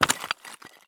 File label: jump tile; Escape from Tarkov